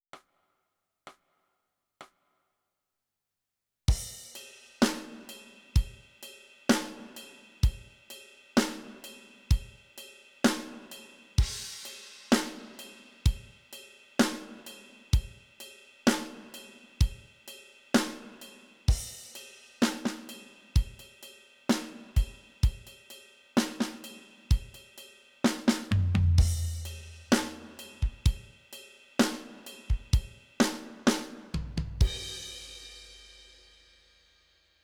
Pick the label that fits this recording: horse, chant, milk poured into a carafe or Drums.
Drums